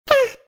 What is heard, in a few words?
connect; monstergo; pokebot